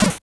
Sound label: sid snares